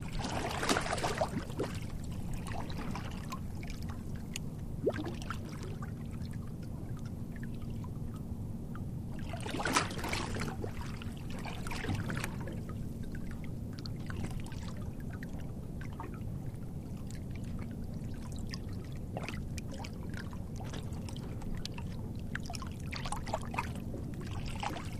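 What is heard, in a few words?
Water Lap Light Movement, water laps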